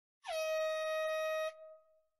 Buzina de esporte

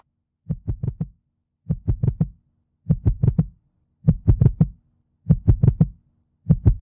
Coracao batendo

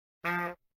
Buzina de palhaco

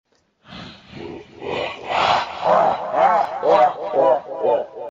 Risada maligna

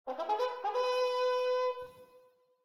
Buzina de investida

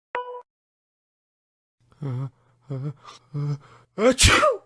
Espirrando

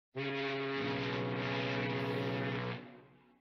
Buzina de neblina

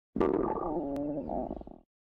Estomago roncando

